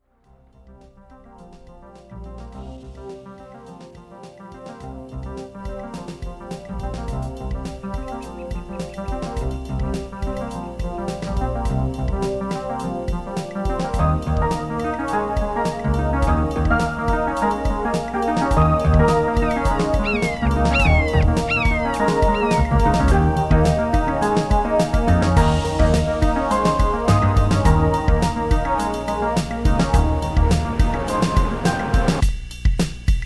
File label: beach forecast vhs drums